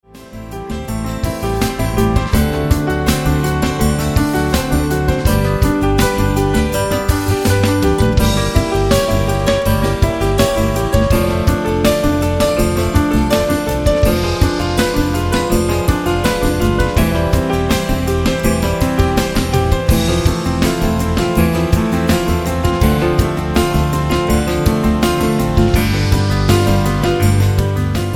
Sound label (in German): Praeludien in C-Dur und C-Moll